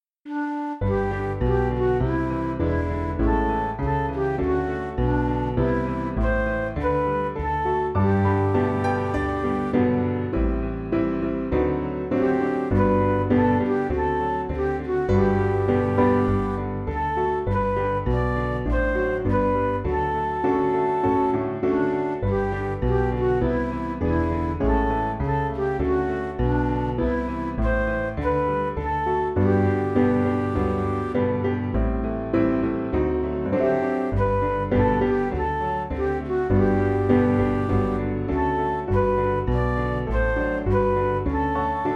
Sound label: Midi; A Charge To Keep; Cambridge